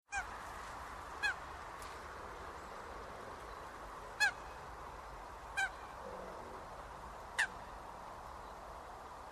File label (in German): Blässhuhn Weibchen